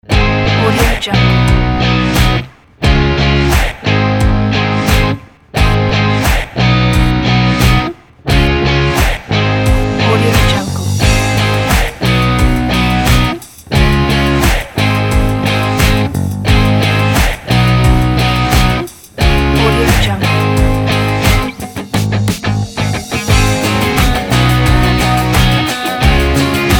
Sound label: indie rock